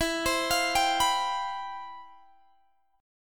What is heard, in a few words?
C Major 11th E